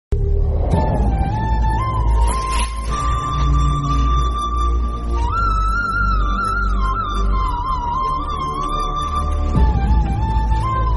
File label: instrumental bgm theme tamil; sitaraman